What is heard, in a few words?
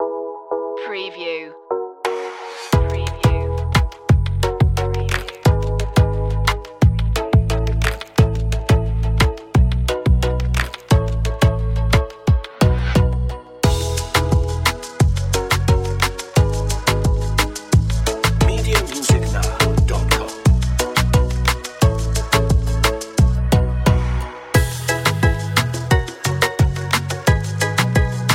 Drums and Bass, dancehall